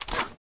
uzi holster

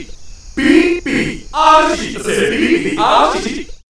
beep beep

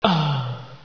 grunt idle; hgrunt2

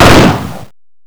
m16a2 burst

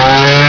chain miss

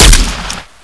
vsk fire, weapons

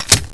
tommy bolt slide back

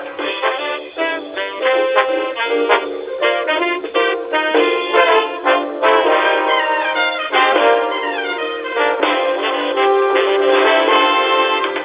jazzy

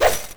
chain swing

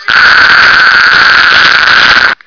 static; barney

